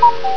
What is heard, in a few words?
CUCKOO